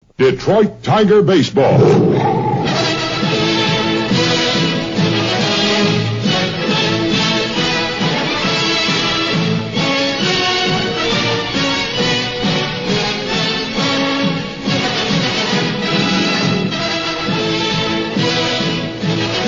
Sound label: march; wjr; tigers